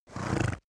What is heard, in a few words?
horse blow short